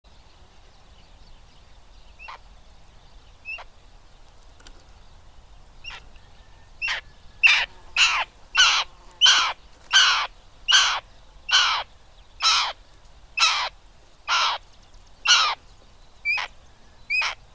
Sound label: Elanus caeruleus; roofvogels; Accipitrinae; Grijze Wouw; Accipitres; Accipitidae